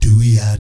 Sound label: DOOEEOT, Vocal Jazz Fx